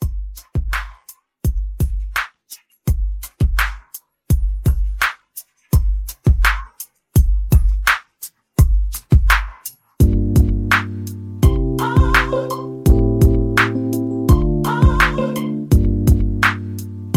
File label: Throwback RnB Club 84